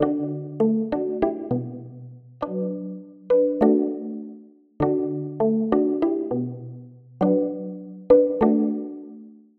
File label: Dream Woodblock